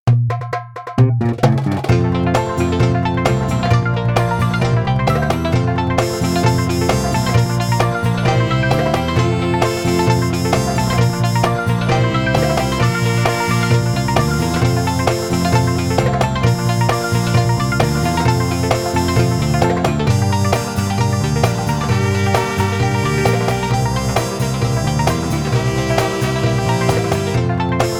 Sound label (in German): Reiseziele instrumental